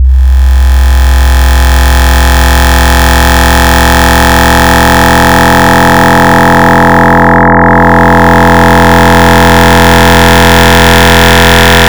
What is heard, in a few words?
FM Table, FM Wavetables; Cymatics; SERUM